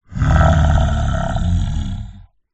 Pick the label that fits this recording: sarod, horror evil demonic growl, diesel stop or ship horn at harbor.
horror evil demonic growl